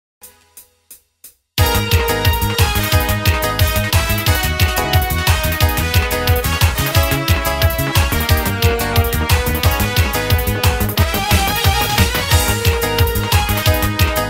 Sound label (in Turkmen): minus karaoke turkmen aydymlar